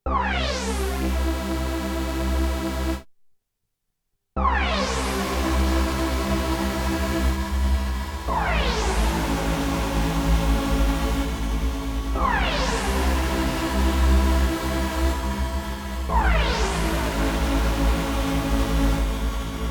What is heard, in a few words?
Sound Layering; KORG FX; Albedo